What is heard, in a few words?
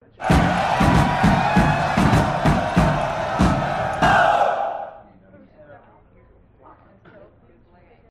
game win; gwent classic v4.0